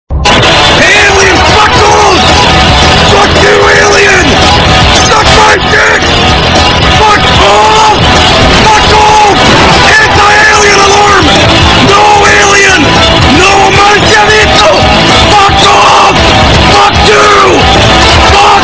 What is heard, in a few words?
anti alien alarm